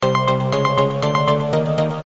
exploder timer close to zero; gardenbloom

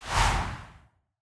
whoosh short; jewels

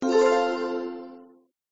dropper deliver; gardenbloom

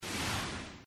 window swoosh; 2048

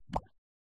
insert figure; tetris

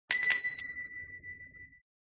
shop open; gardenbloom

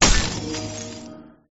ice destroy; gardenbloom

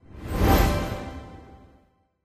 line combo; tetris

